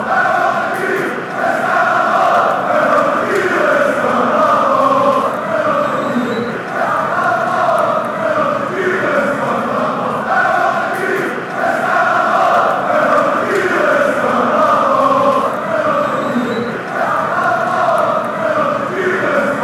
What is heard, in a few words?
chant; genk